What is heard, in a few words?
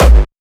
Distortion Kicks, Bassdrums